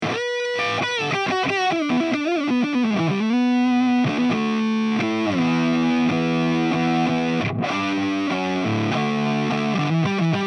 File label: Neck Fuzz